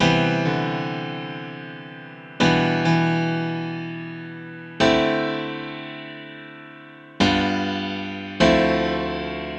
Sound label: Grand Piano 100 BPM (A Minor); Flow; Ghosthack; Free Guitar And Piano Samples